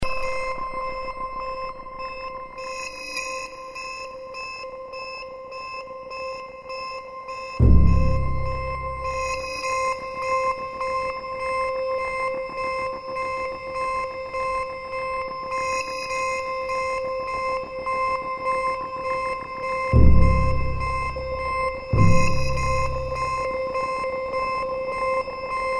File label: Tension